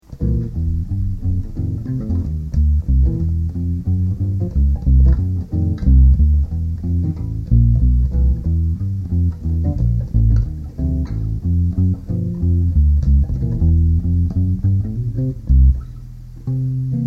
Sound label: walking bass